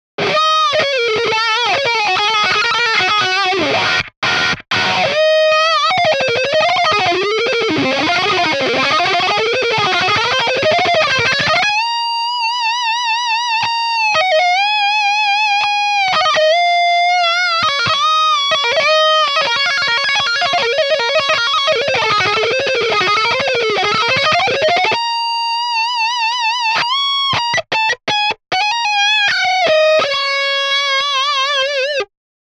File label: Lead Wah; Mercury2CP